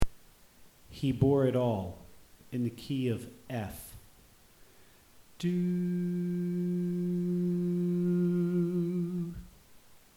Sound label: Alto Left